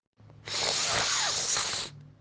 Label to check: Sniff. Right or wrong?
Right